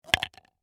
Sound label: Golf Ball Hole, Sports, Golf, Ball, Into, Hole; Put, Put; Golf (Sound Effects:Sports); BlastWave